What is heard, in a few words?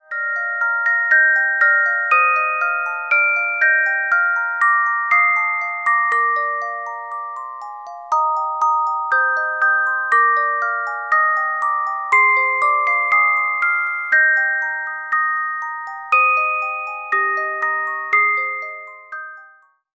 Carillon Music Box Melodies